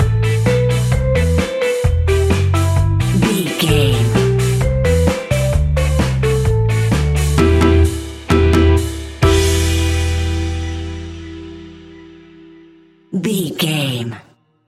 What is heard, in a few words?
ska madness, Classic reggae music with that skank bounce reggae feeling.